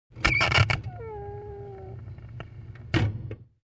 Compartment Door Creak

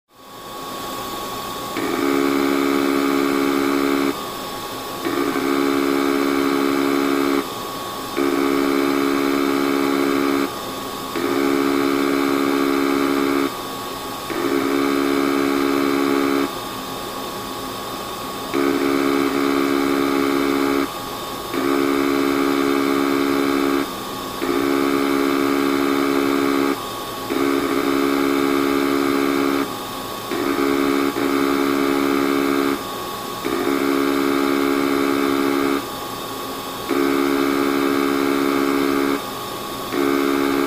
Office device buzzing

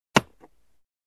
Storage box close

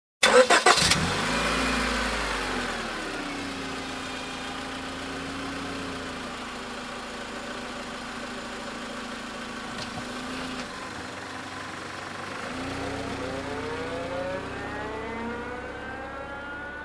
Car engine ignition and reversing

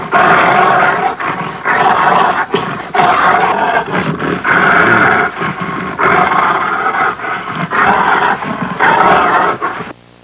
Angry growling

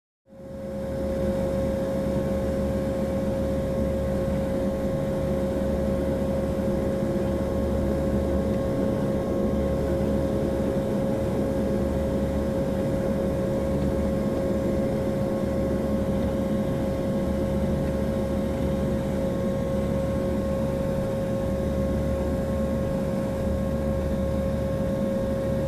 Cabin Ambience with Engine Noise

